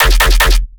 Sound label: bass hit knock knock E; Virtual Riot